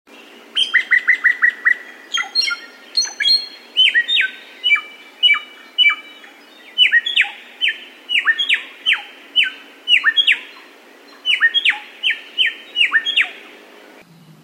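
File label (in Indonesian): kombinasi samyong tiumi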